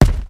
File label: explosion add attack; guns; tanks; War Thunder